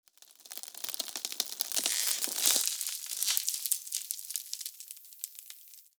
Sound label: greed turns to gold; greed death